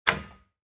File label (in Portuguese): porta destrancando